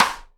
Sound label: SIDE STICK, Live Snares